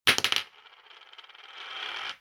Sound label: dice